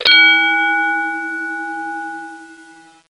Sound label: BELL; Blood: Fresh Supply